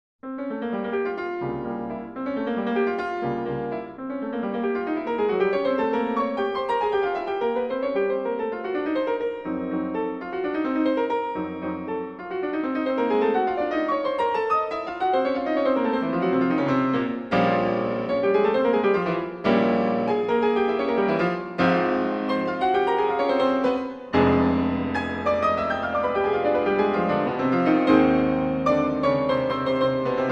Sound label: Préludes, Op. 28 No. 18, Molto Allegro In F Minor; Chopin